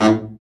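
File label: baritone sax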